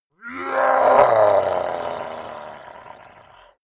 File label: monster dark growl; kreaturen